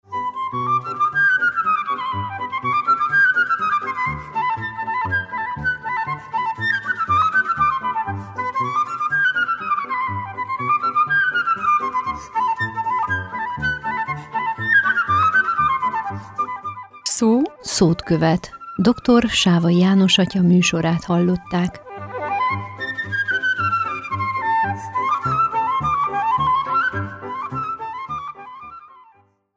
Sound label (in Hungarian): VEGE Jingle; SZO SZOT KOVET; SEPSI